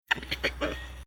Nature Jungle Animal Monkey Several Long Tailed Macaque Talking; Tom Hutchings